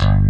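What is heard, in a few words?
ELECTRIC BASS; Pro-Rec - Synthsonic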